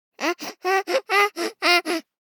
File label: BABIES TALK Boy 12 months Donkey Like; Baby Boom